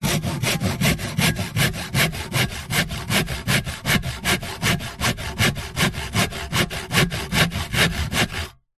industrial hand saw sawing wood hollow steady pace